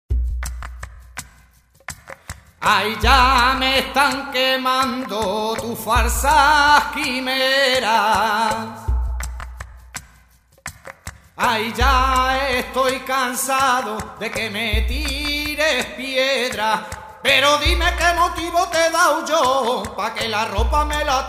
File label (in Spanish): tangos de triana